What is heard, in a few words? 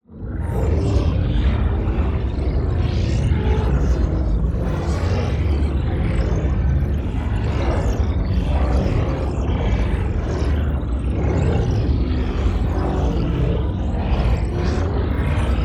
ABILITY CHARGE Dark Bioform; DSGNMisc; boom library; Matter